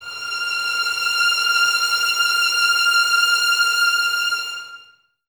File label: VNS F 6, Violins; Miroslav Vitous; String Ensembles